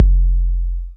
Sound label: Bass Drop, 808; Street Anthems Sound Kit